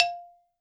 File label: balafon f3; WORLD INSTR 2; WORLD PERCUSSION